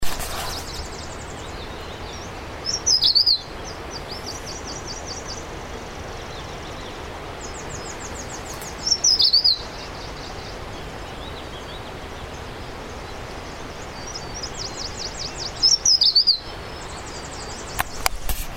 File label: tesia chestnut headed Cettia castaneocoronata